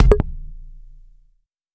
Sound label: navigation sound